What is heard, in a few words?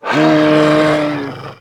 attack; tf; slender; therium